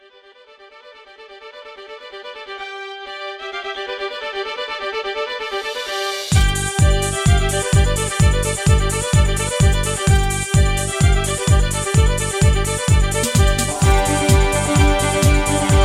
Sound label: Hoedown Instrumental